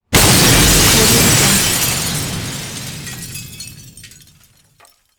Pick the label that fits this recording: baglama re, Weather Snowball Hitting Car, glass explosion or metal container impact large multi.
glass explosion